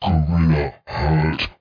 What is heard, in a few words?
Gorilla; PC Gamer IT CD 41; machines demo